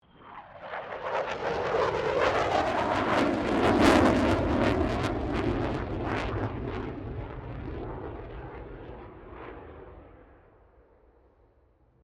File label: AIRCRAFT FA-18 HORNET PASS; RAAF FA-18 Hornet; Airplanes; Sound Effects:Vehicles